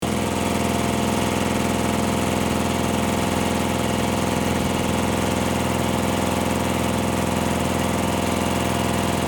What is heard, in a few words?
Air compressor machine engine noise loopable